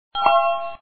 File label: guest goal